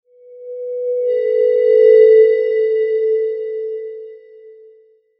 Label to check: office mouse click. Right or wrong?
Wrong